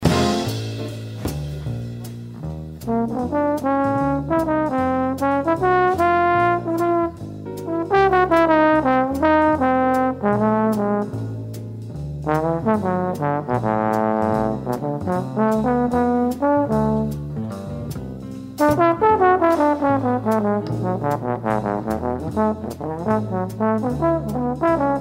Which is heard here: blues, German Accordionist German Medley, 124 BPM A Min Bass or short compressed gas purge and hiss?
blues